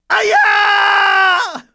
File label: KNOCK; TrueCrimeStreetsOfLA; XBOX